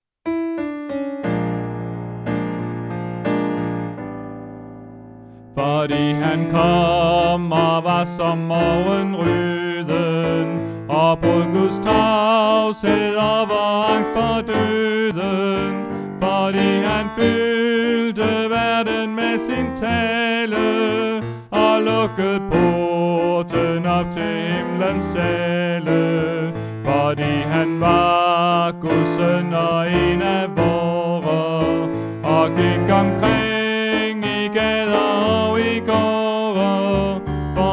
vi har et haab Bas; oevefiler

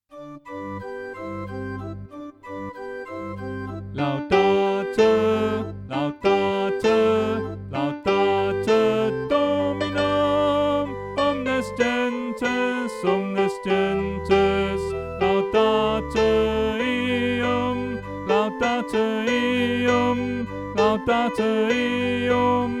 Sopran2; Laudate; oevefiler

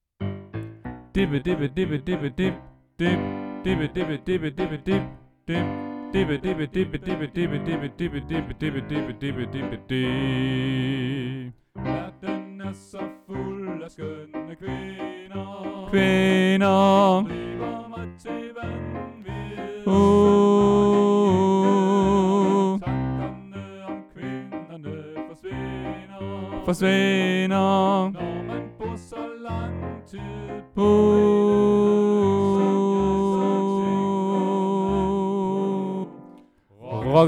Sopran 2; Ostesangen; oevefiler

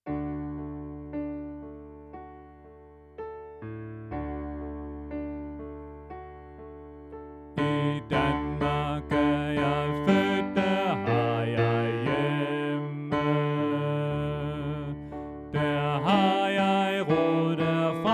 I Danmark er jeg født Bas; oevefiler

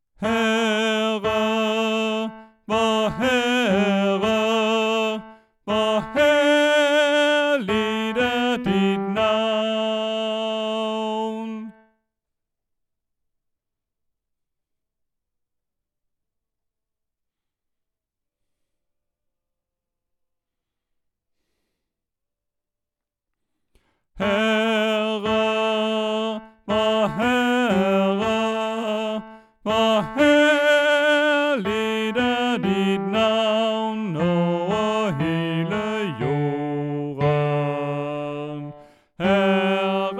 Herre vor Herre Bas; oevefiler

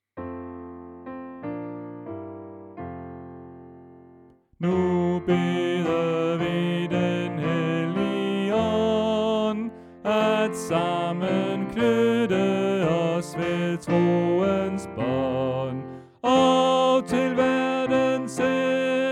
Nu bede vi den helligånd Tenor; oevefiler

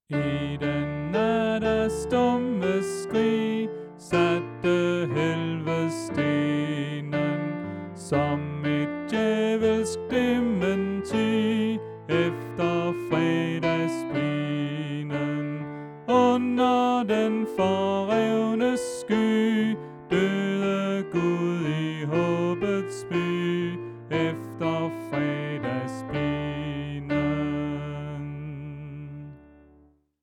I den nat af stumme skrig Sopran; oevefiler

